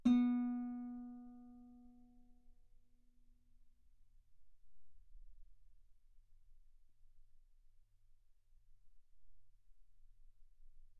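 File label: Guitar ff B string B3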